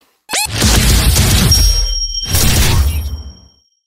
1 Up; Doom Eternal

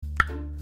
Tongue Pop

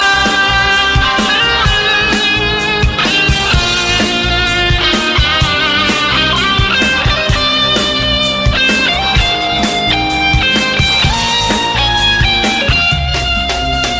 Loud Tone

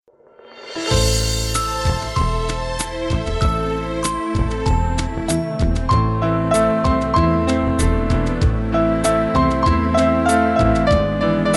Romance

